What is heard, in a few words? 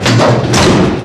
Door Open